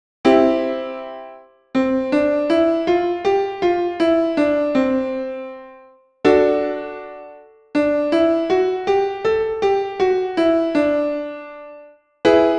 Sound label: 5tone